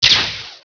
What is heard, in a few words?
spell, monsters insect; Heretic II